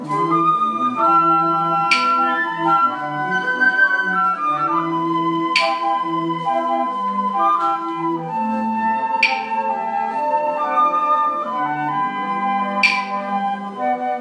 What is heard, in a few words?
claves; gakki